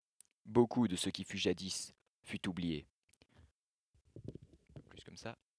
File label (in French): basse